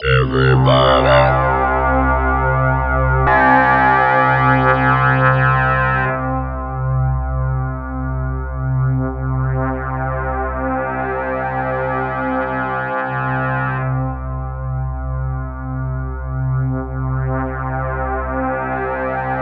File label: SynthLine C2; 90 s Sample CDs; NiceBeats; SynthLine VOL-13